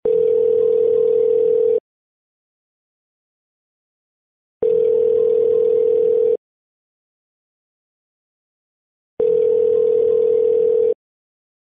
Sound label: internal phone ringing - 7 rings